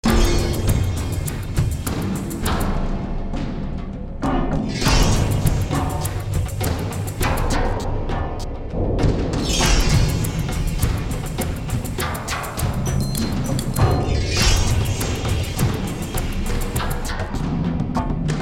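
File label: Battle Loop City Percussion; Fallout New Vegas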